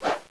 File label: knife miss; svencoop